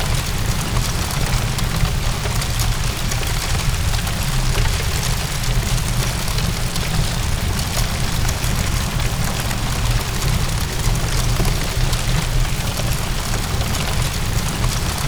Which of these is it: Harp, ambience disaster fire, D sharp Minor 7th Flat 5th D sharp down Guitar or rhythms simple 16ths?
ambience disaster fire